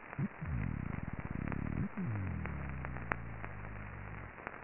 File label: Nassau Grouper